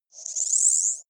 sci-fi power on fast; swooshes-swishes

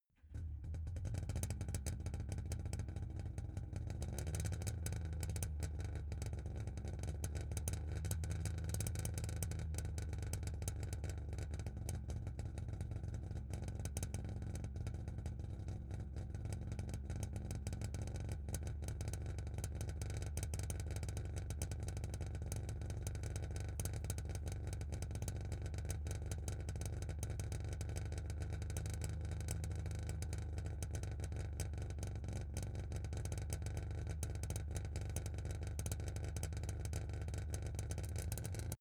car saab 92 startup idle exhaust

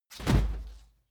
whoosh cloth; swooshes-swishes

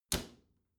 bathroom cabinet close regular

